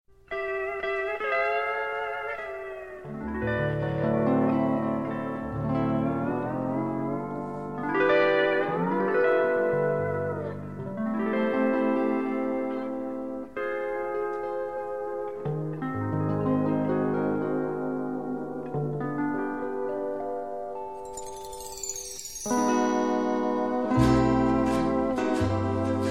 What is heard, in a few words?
Guitar blue phrase